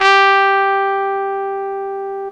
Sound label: trumpet; MATLAB